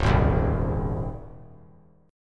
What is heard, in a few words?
C sharp Major 7th Suspended 2nd C sharp; C#M7sus2 strummed; 1,3,3,1; JGuitar